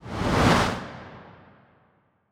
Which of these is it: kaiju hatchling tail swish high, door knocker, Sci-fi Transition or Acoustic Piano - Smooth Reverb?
kaiju hatchling tail swish high